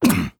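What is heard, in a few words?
voice collide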